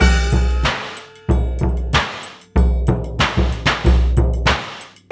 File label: Hip-Hop Gong